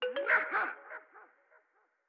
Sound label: Attack; StyxMech; Going Under